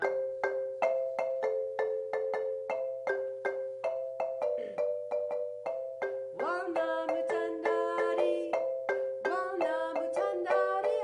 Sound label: voice